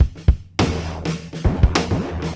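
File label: 102 bpm, beat loops; Zero-G; Monster Beats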